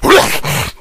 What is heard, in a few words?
rage at victim, infected